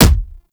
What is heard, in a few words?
Explosion Punch Generic Small; Warframe; Weapons; Explosion Punch Layers